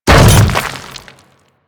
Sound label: Hammer Boss Land Dirt, Footsteps; Warframe; Grineer